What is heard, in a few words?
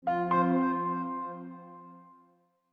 New Alert; gamerip; Samsung theme for Windows XP